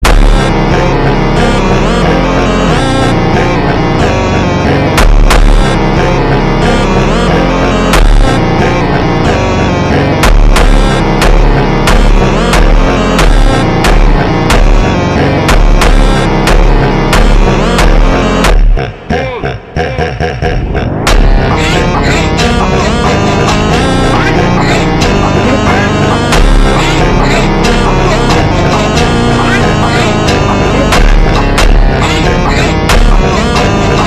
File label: Ultra Slowed